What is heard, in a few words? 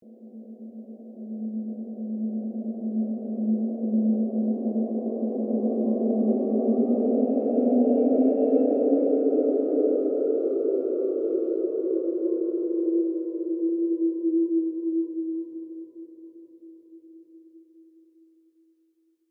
Ghostly Movement, Horror, Ghostly, Movement, Haunting; Ghosts